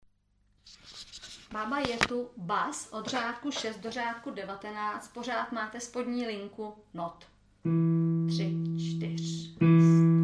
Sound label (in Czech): druha cast kluci bas